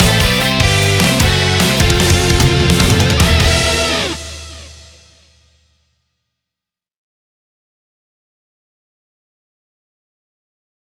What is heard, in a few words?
Failed; Valkyrie Drive Bhikkhuni